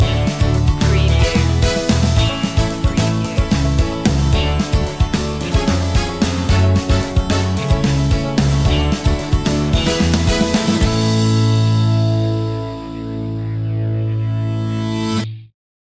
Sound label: power pop goes bang